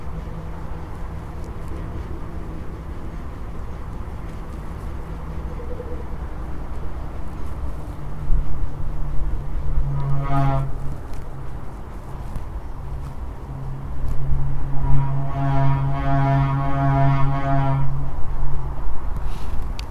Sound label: resonance 80rpm, turbine